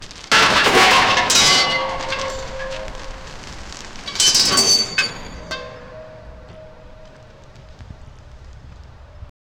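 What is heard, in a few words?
far concrete crashing metal; skyscraper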